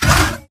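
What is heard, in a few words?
Stalker Movement Servo Heavy Short; Titanfall 2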